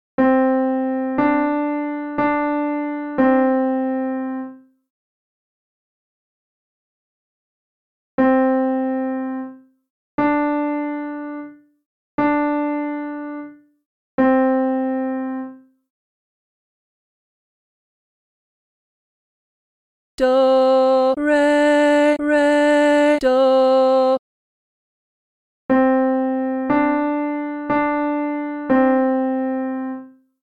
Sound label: easy solfa melody